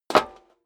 step high heels metal dry jump; schritte; Footsteps; menschen

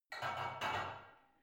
barbell only replace unsteady reverby soft; sport freizeit; fitnessstudio

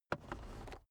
car headrest full adjustment soft

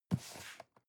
cardboard box medium grab slide soft; objekte

